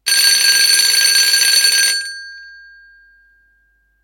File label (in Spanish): Teléfono